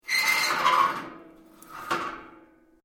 Metal plates scrape; Materials; Foundation

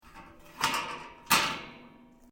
METAL PLATES MOVE; Metal (Sound Effects:Materials)

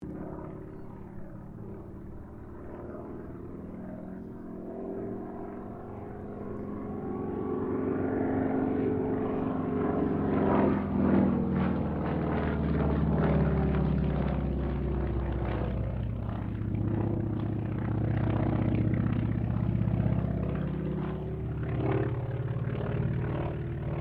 AIRCRAFT CAC WIRRAWAY PASS DISTANT STEREO; CA-16 Wirraway; Airplanes; Vehicles

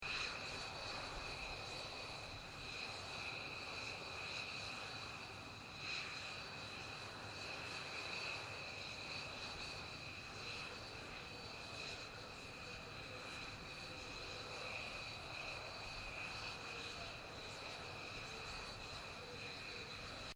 unknown jetfighter idle prelaunch; Various unidentified aircraft; Airplanes; Vehicles

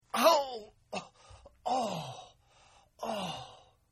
Grunt; Grunts; Sound Effects:Voice; Partners In Rhyme

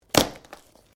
Branches Dead Snap

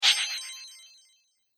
Coin Magic, magic sound effects

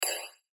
button high tech; Multimedia

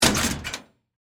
Locker Close, Sports, Locker, Door, Close; Gate; Miscellaneous Sports; BlastWave

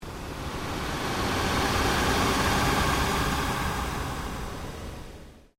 PULSE LONG SWEEP

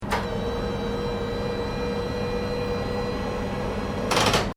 SERVO, small electric motor; Motors (Sound Effects:Machines)

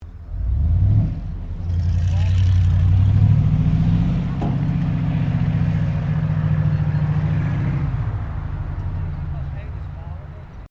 4WD HUMVEE MANOUVER, US Airforce model Humvee; Truck; Trucks; Vehicles

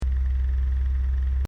Fan: Small Desk Fan Running; Electronics; Fan Sound Effects; Fans (Sound Effects:Electronics); Stuart Duffield

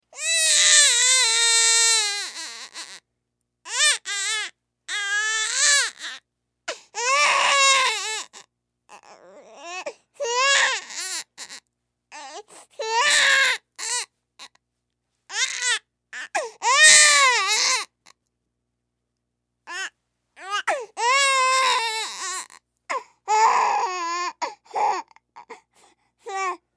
Human Baby Crying Long; Babies; Voice

